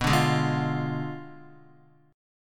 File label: B Diminished 7th, Guitar; Bdim7 strummed; JGuitar